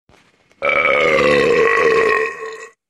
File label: King Burp